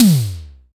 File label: hi tom one shot g key; Mono; tom drum samples